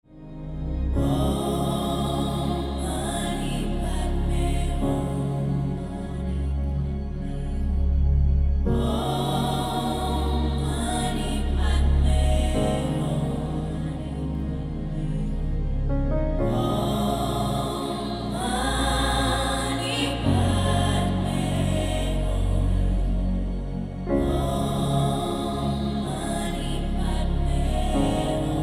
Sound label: Om Mani Padme Hum; Theta Music